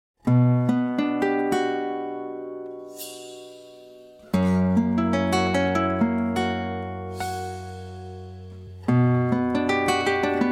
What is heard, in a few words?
Gitarrenduo, Danza vivo